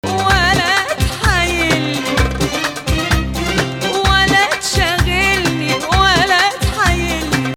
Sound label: Hijazkar Rast